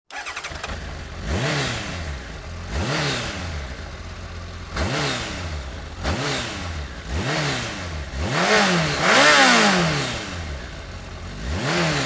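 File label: Honda CBR 1100 XX Superblackbird, motorcycle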